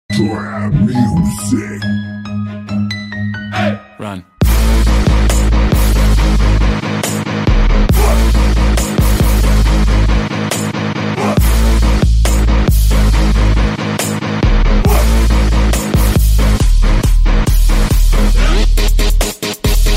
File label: trap